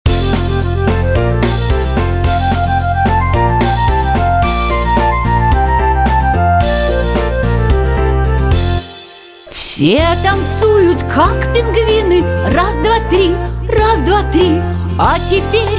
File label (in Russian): Музыкальная игра Зоопарк